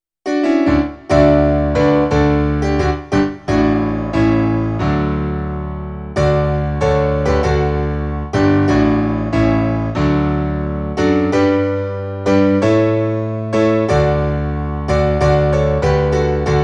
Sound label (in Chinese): 普世歡騰歌 Piano; 3段版; LKY School Choir 梁季彜合唱團